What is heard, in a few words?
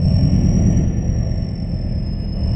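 Ambience Sci-fi Energy Loop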